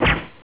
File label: shot; warcraft3